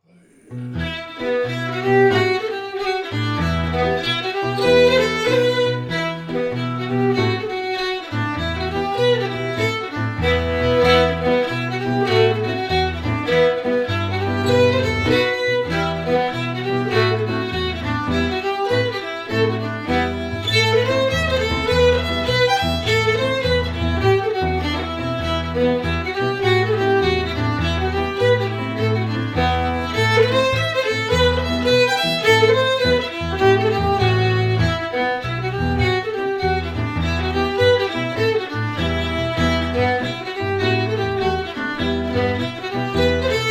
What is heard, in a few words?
FIDDLE PIANO; Jink & Diddle Book o' Tunes 2021